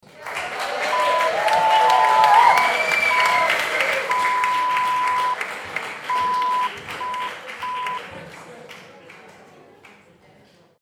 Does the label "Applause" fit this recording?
Yes